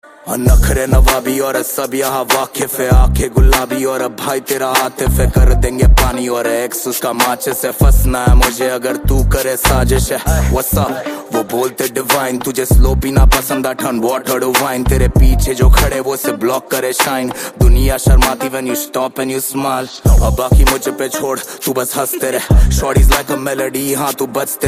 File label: Hip Hop